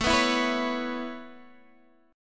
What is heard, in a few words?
B 7th Suspended 2nd Suspended 4th A